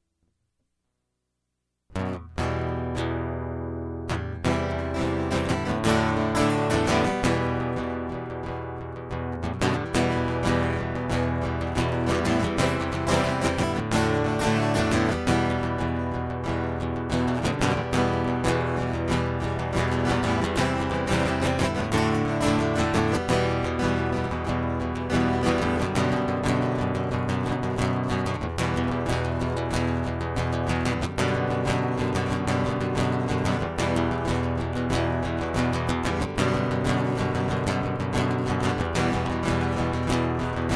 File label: Guitar Prequel